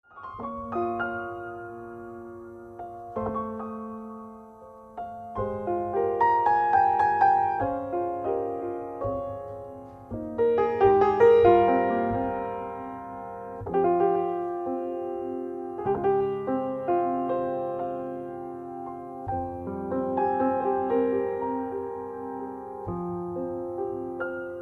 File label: jazz kolo